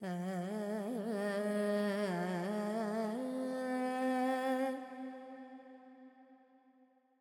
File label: FX Purvi Kalyani, Carnatic Female Alaps; Vocals; Sample Banks